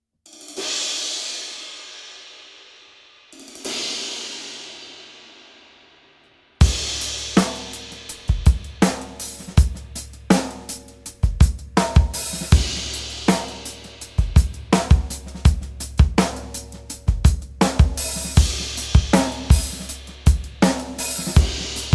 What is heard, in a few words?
Meinl Vintage crashes